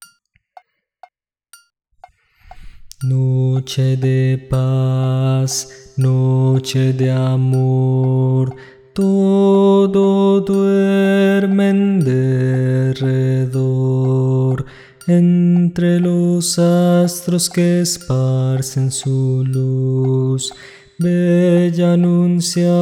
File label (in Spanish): Bajo Voz; Noche de Paz